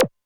Blip, Drum Machines Alesis D4

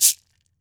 CABASA GOLD; Brazil

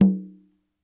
Sogo Med, World Percussion One Shots; Sony CS

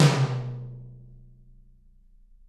TT, Toms; Bob Clearmountain Drums I

